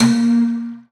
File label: tonal oneshot Bb; Pop; Slate; PASADENA Pop Sample Pack; Tonal One Shots